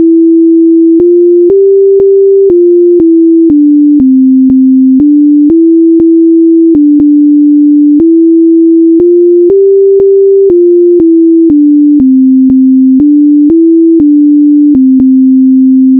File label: sine; C++ program